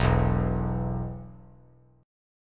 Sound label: E 5th E down; strummed; JGuitar